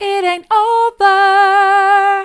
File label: it an't over soft, vocal